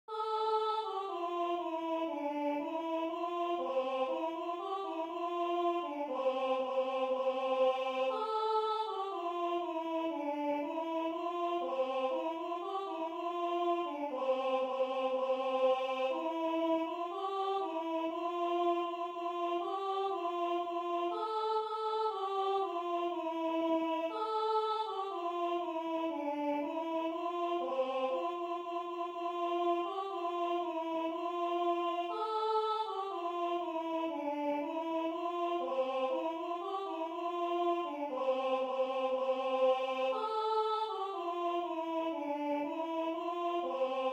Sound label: Alto; Deck The Halls